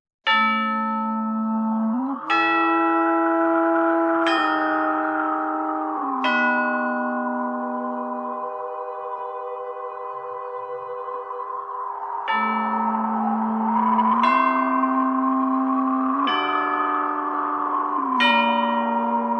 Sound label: Sound Effects Haunted Mansion; Disney Sound Effects; Disney Theme Parks; Halloween